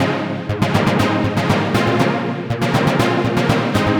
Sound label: Trance Synth